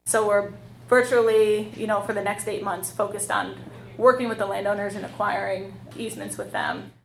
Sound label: Pipes